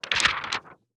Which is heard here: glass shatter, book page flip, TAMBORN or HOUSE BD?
book page flip